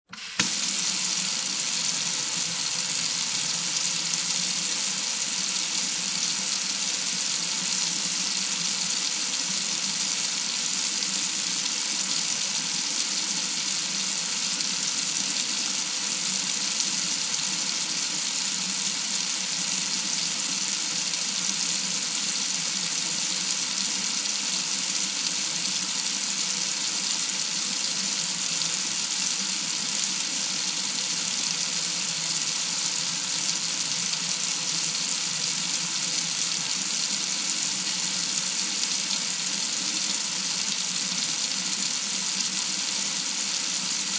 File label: Faucet Kitchen On Run Turn Off, Household, Faucet, Kitchen Faucet, Turn On, Run, Turn Off